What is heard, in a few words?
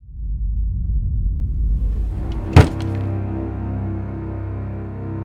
Falling Cross; Lucius III